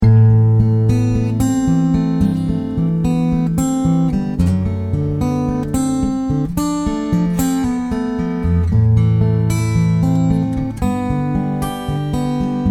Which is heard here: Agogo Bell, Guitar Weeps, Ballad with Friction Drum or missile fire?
Guitar Weeps